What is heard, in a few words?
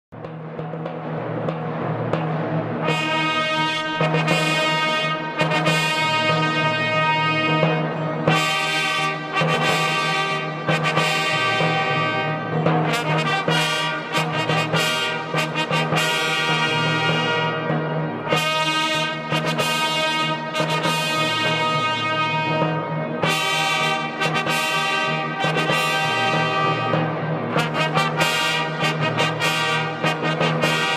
medieval trumpet